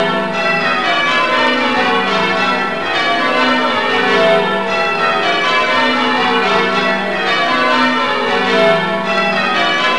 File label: Rounds, bells; OldBells